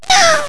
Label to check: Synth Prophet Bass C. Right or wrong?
Wrong